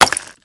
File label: Iceblock Impact; MilkChoco